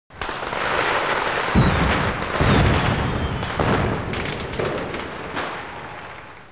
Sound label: fireworks